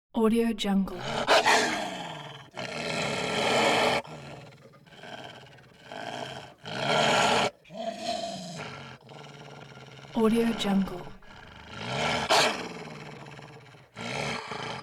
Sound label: wolf vicious growls and snarls; hollywoodedge